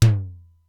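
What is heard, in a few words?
Simmons SDS-9 low tom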